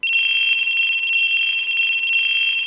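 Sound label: keitai echo, mobile phone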